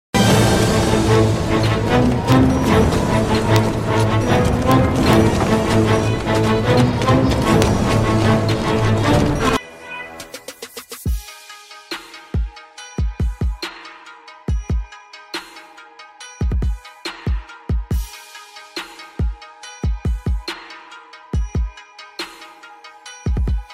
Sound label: Turkish Trap; Dirilis